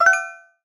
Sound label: notification